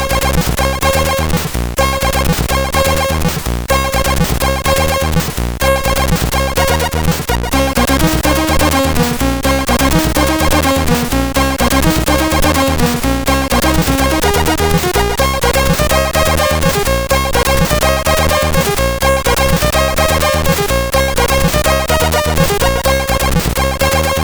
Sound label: Small Song CSG8580R5